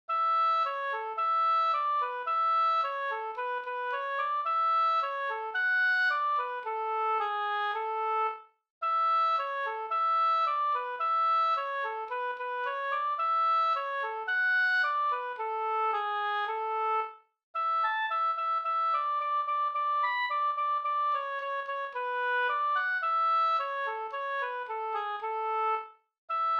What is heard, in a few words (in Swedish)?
anglas